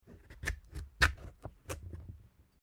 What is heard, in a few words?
PUMPKIN CUT; Various food preparation; Punch And Hit Sound Effects; Punches and Hits (Sound Effects:Combat)